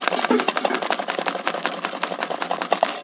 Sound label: Shaking; Foley; SFX; 7rystan's 600+ FREE EDM SAMPLES & DRUMS